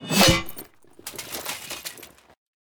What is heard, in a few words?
locked in combat; battle